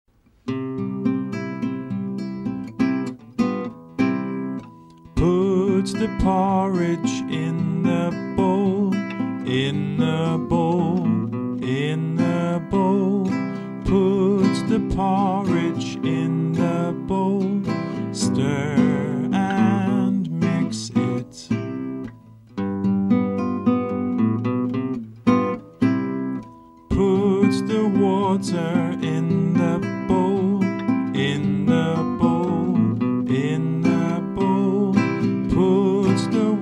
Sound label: Porridge Song Chant; English; Songs and Chants for P.2